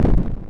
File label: flamethrower; weapons